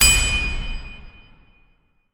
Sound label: Attack Hammer; endless world idle rpg gamerip 2019